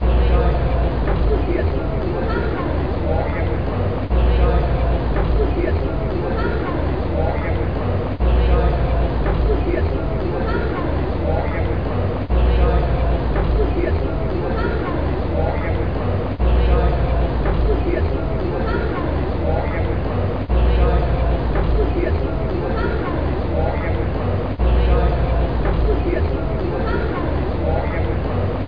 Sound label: CITY